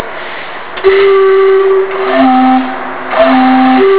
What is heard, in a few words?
Steamclock